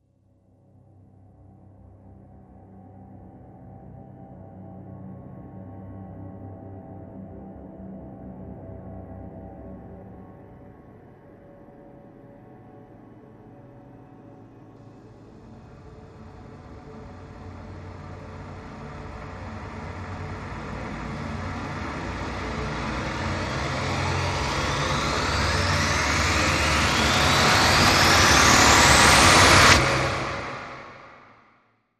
Drones

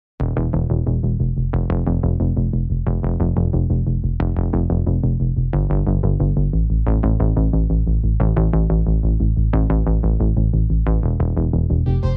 Breakdown Mix

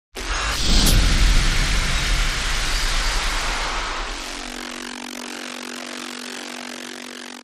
Hit Wavelength

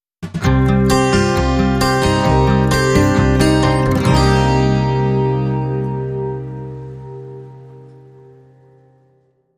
Natural Floating

